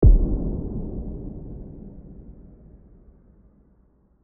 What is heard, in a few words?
High cut